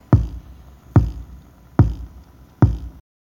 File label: drum